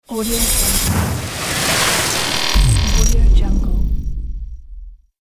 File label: complex glitch stinger